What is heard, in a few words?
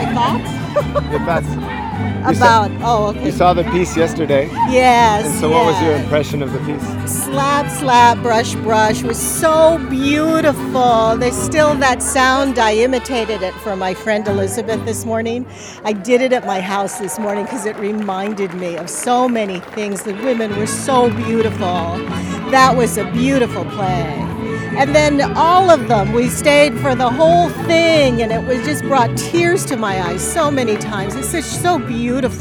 Slap Slap Brush